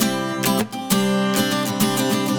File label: Acoustic Chords A, metal